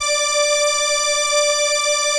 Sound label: ELKA, String Machines